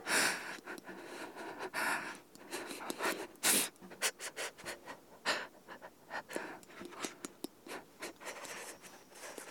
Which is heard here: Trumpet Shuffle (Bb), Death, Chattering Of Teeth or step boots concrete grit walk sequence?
Chattering Of Teeth